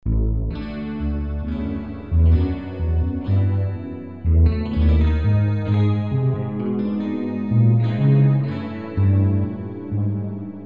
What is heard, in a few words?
Tamburello; guitar; soloduotrio